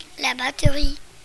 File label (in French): batterie; la batterie